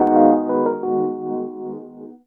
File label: pan; 90bpm; Demo Multitracks